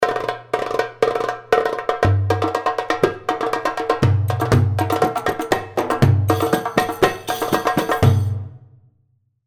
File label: Masmoudy Kebir 120, darbuka